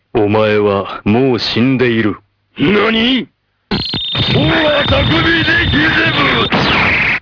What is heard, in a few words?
boombox; cstrike; jailbreak